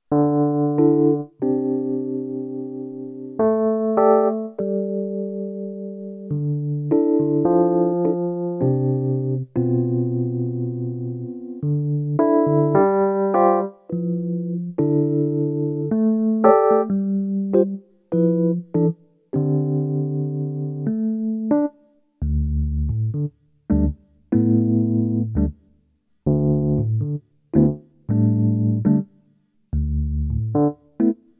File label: e piano